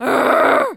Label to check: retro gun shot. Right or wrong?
Wrong